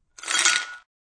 Dropping Ice in Glass, Dropping ice cubes into a glass; Drink Mixing